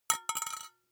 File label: glass metal small drop